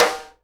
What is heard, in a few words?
SD STICK, Live Snares; E-MU Producer Series Vol. 8 – Platinum Phatt; PlatinumPhattCD1